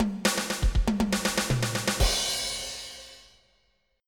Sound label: fill